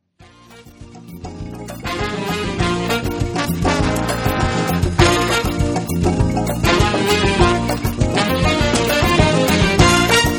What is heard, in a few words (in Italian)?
mambo